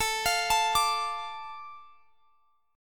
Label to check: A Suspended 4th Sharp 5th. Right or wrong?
Right